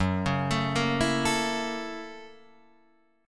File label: F sharp 7th Sharp 9th, Guitar, Open D